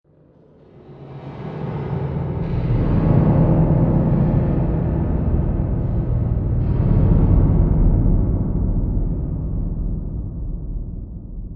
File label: Reverb Ambience, Horror - Scary ambient movement sound, large room; large room eerie ambience with heavy reverb; Lynne Music